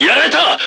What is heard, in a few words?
Japanese Shout; Mars Sortie